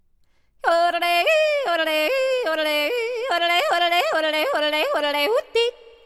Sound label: yodeling with verb